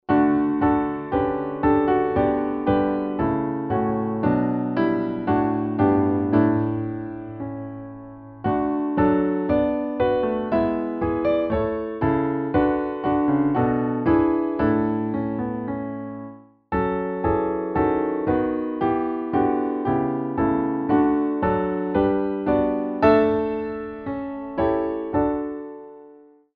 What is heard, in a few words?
alt tune; angel voices